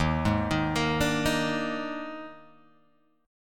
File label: D sharp Major 7th Suspended 4th, D sharp, down, Guitar, Open G